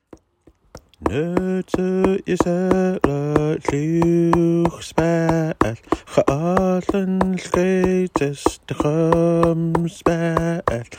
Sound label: Ten Bears Song